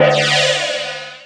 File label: Sudden Death; worms2